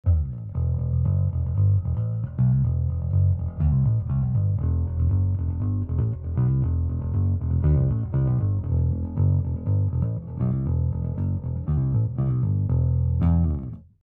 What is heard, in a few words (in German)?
Rock Mikrofoniert Rickenbacker Div Pick Up Positionen